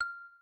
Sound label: dialpad 6; Doov A8